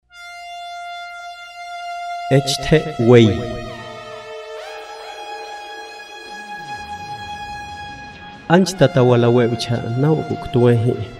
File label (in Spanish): grito del diablo